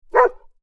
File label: animals dog bark med large; Dogs; Dog Bark (Med Large) 4; McKinney Sound